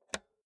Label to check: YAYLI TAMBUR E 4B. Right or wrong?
Wrong